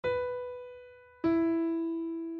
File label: down